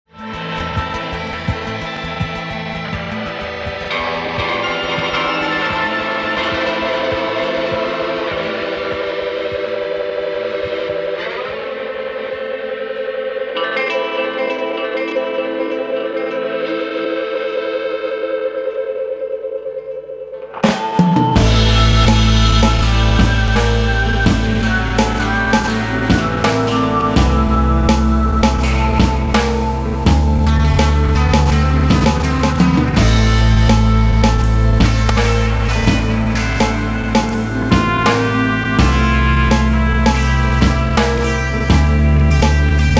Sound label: spaghetti western